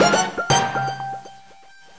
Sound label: Jingle Last Lap